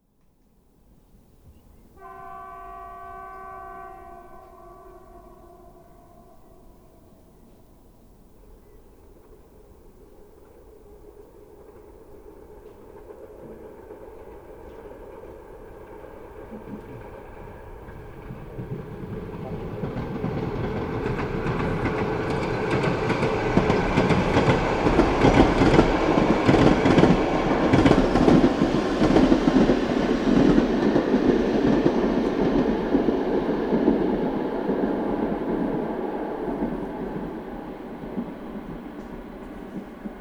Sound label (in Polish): Hetman wieczorem w Zwierzyncu z okna